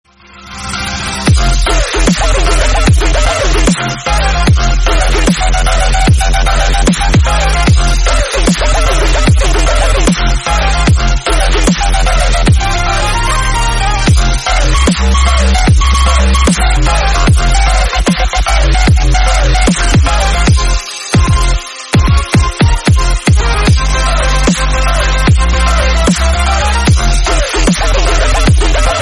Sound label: dubstep music